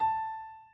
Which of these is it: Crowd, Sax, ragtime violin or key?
key